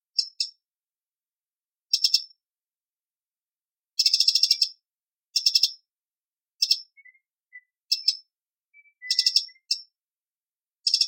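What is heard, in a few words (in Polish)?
strzyżyk głos zaniepokojenia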